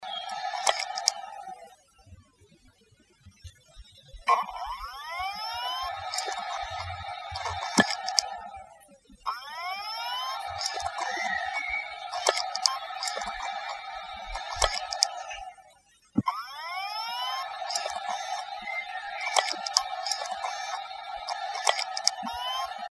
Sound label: Hard Drive Death